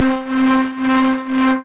Sidechain Charger, Synth Rhythmic; Ableton; Factory Packs; Build and Drop

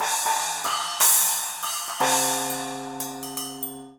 Cymbals

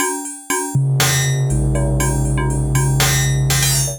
Metal Kit, Drums Synthesized; Ableton; Factory Packs; Drum Essentials

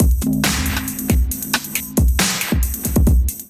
Kit Melodic 137 bpm; MIDI Clips; Drums; Bow Road Kit; Ableton; Factory Packs; Skitter and Step

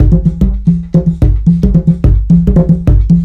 147 African Big Ekwe; World; African Percussion

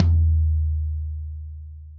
FLOORTOM 1412 stick center; FLOORTOM SR 1412 stick center; Ableton; Factory Packs; Drum Booth

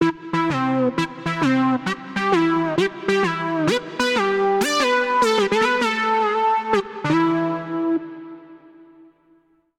12 Voice Brass Melody Cmajor 88 bpm; MIDI Clips; Melodic; Obee; Ableton; Factory Packs; Chop and Swing

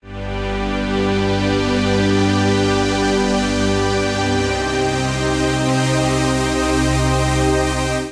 Pad (G) 118bpm; Music Loops; Gracious Fields; Ableton; Singularities

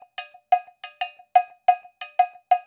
090 Dance Hi Bell; Dance DJ; Percussion; Metallic Perc

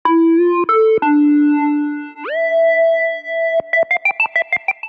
Sine D Minor 88bpm; MIDI Clips; Melodic; Ableton; Factory Packs; Chop and Swing

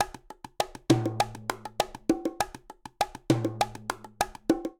Charanga Bongo-200bpm; Latin Percussion; Ableton; Factory Packs

